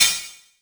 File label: Crash Cymbal